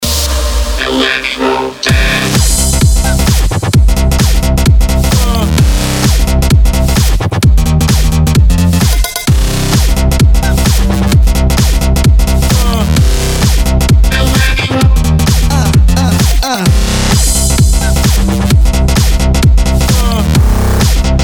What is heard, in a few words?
fast foot electro dance